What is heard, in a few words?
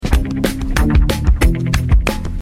beat